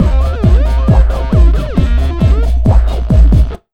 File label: Big Beat Drumloops, 135